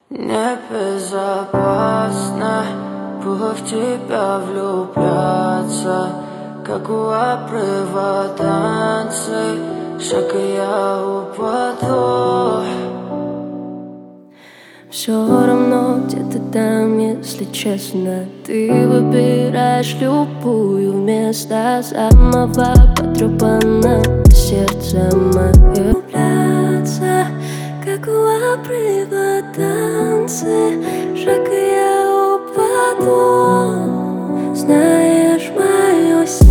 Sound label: Acoustic